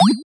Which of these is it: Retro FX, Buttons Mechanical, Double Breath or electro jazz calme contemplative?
Retro FX